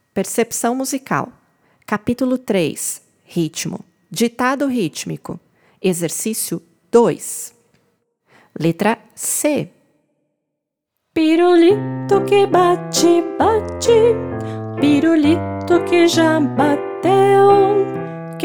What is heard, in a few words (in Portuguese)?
Ritmo Ditado Ritmico; PERCEPCAO